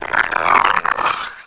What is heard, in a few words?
Whale Burst; Finding Nemo; XBOX